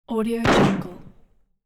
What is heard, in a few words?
m16 automatic machine gunshot; hollywoodedge